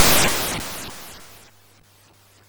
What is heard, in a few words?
Frantic, MOS6581R4